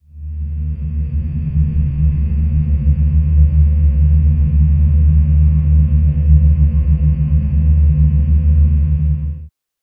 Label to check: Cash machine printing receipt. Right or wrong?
Wrong